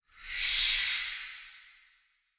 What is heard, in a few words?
Whoosh; Whooshes